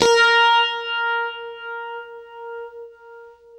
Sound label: UNIVIB A#3, Guitar; East West; Steve Stevens Guitar Samples Collection